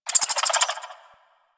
Bird Clicky; Warframe; Ambience; Eidolon Plains; One Offs

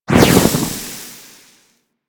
Inferno Wildfire Extinguish; Warframe; Ember

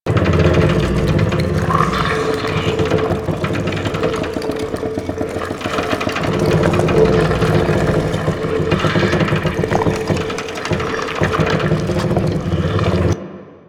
Rotating Dial Orb Loop; Ambience; Props; Warframe; Entrati

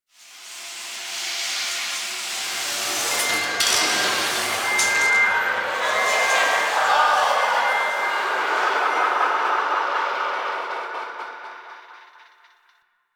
Ghost Cargo Echoes; Warframe; Quests; TheNewWar; Ambience; Zariman

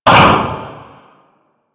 Falling Tile End; Warframe; Clan Dojo; Obstacle Course

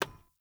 Foley Action Polearm Hand Pat; Characters; Player

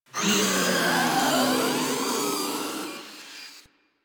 Proto Infested Ancient Death, Enemies Vocals

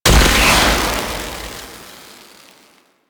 Glaive Frost Explosion; Warframe; Tenno; Teshin

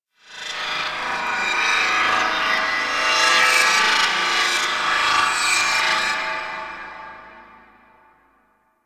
Undercroft Rev Delay Warp Space One Off, Ambience; Warframe; Duviri

